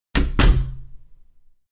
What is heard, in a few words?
Car Door Closing; gamerip; escape from russia mobilization